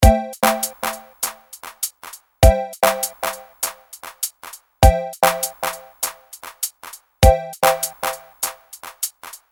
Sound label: delay